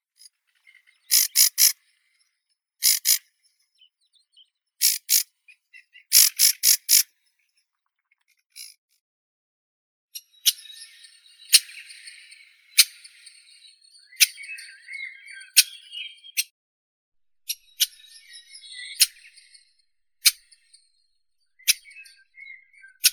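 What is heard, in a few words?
sedge warbler, Acrocephalus schoenobaenus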